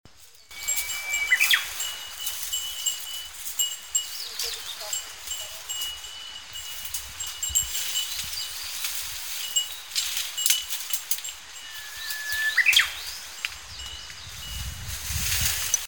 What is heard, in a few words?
bush warbler